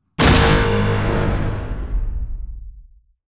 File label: Seismic Charge Blast; Ep2; Slave 1 Off